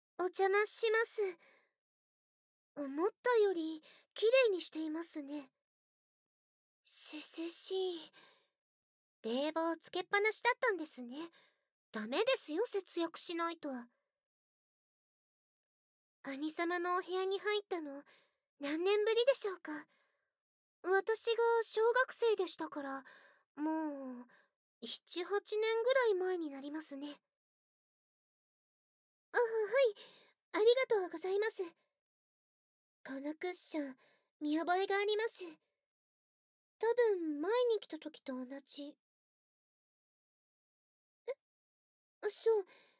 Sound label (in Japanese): 初耳舐め, ASMR